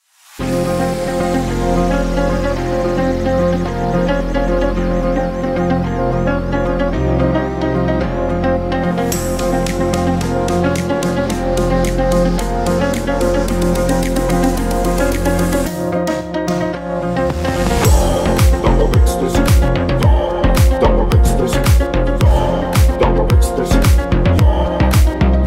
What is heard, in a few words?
remix